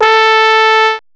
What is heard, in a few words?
BRASS; BRASS1